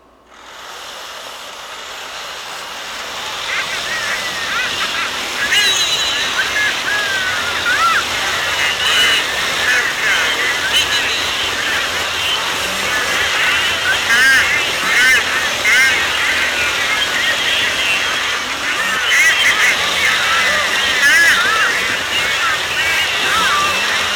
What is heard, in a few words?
wall sound